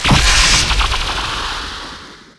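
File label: elemental buster wind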